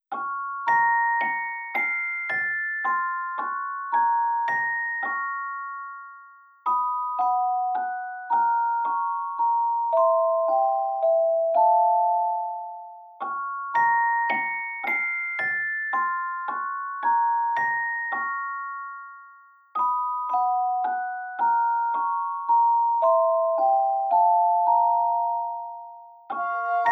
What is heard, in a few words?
sad music box